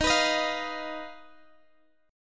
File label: D sharp Suspended 2nd Sharp 5th, Guitar, Half Step Down